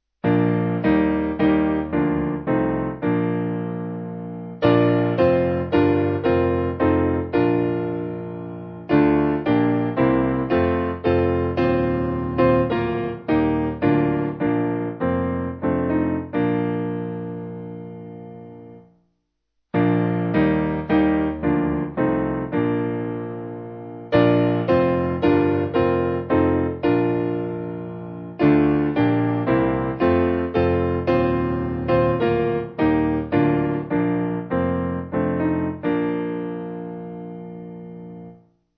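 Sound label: Piano, Music Backing Files for Churches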